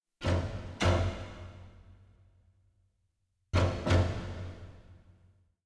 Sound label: Fight Music; Sherlock Holmes The Devil's Daughter